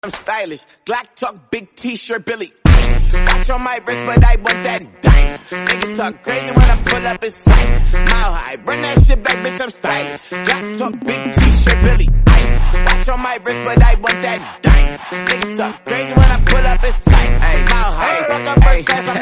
mashup spanish remix english

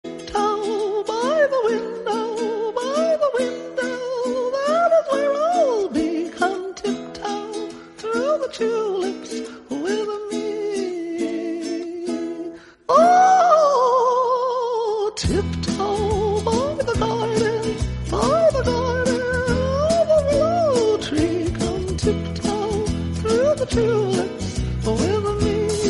bgm; insidious

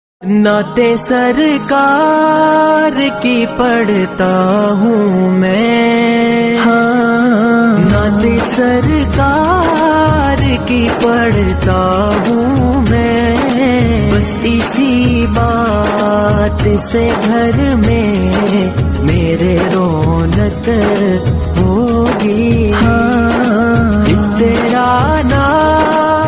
naat

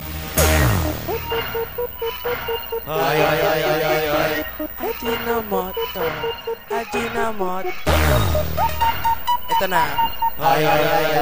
low intro tagalog

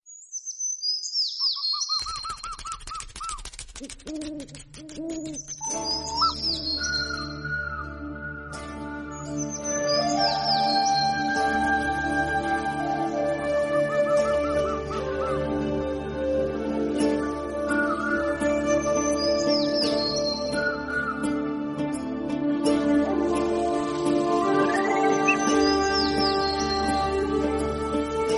3d birds

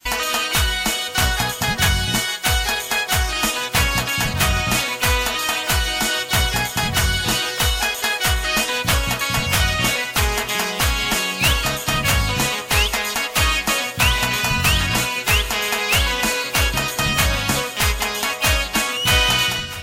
gujrati ringtone

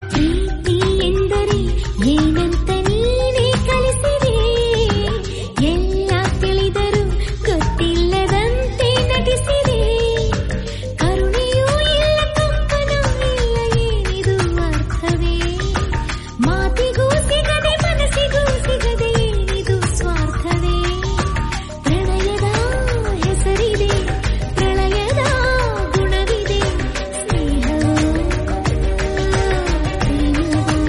ugadi kannada song

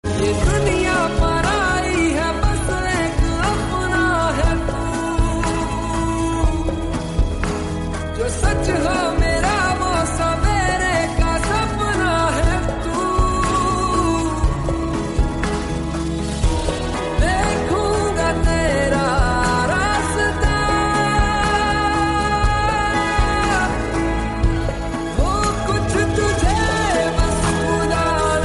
hindi song

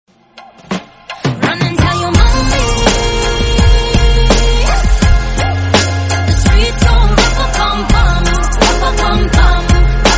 hindi song

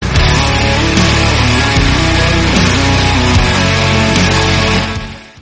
rock notification

